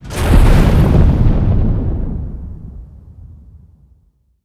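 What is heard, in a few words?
explosion long; weapons; starruler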